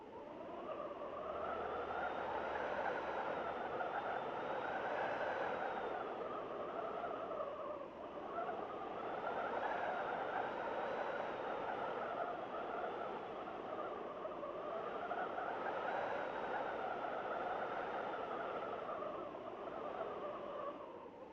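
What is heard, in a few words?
Ambience Wind Loop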